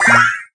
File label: slide; Pastel meringue cookie; cookie run